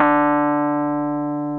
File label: EP B1, FENDER RDS